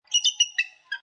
American Bald Eagle Squawk Staccato; Bird of prey: American bald eagle sound, staccato squawking; Eagle Sound Effects

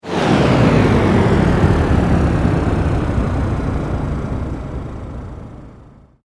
Sweep Mechanical Slow

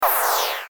Rollover Down Ascend High Fast; Mouseover; Multimedia; Blastwave

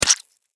Impact Bug Hit Windshield Squish; Splats; Multimedia

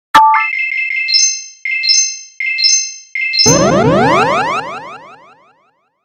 Logo Blip Chimes Ascend Delay; Sonic Logo; 5.1 STEREO MIX; Blastwave

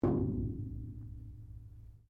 METAL IMPACT QUIET; Metal Sound Effects; Metal (Sound Effects:Materials)

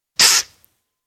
Four week old kitten, individual mews; Cats; Animals; BBC

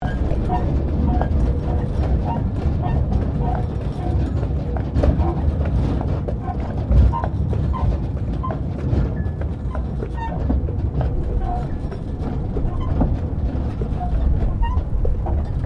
Tram Horse Drawn Carriage Rolling; Horse drawn tramcar; Carriages; Vehicles

